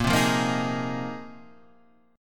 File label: A sharp 7th Suspended 2nd Suspended 4th, Guitar Half Step Down chord